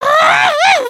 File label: failed build